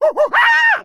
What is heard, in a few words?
monkey death